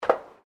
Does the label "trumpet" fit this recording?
No